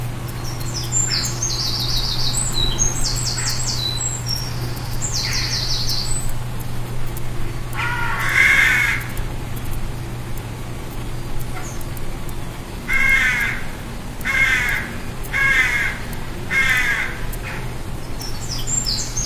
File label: bird noiz